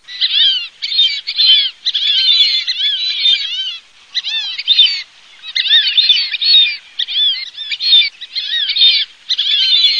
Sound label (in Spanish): SIRIRI MIX